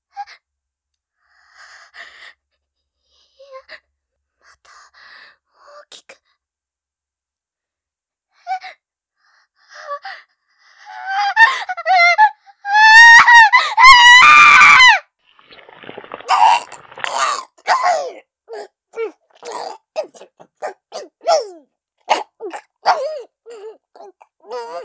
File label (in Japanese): ゲップ; イラマチオ2